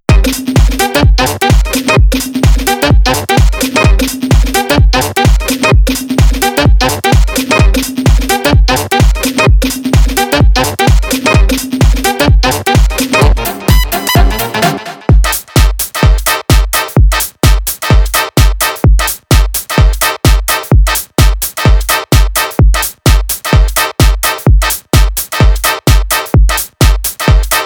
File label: grooves trumpet house